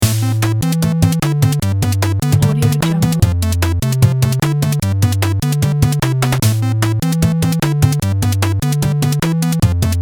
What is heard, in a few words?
8-bit game